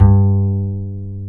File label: AC BASS, JAZZ